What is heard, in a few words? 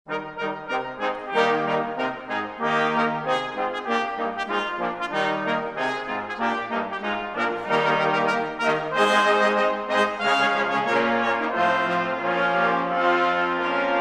Blast of Brass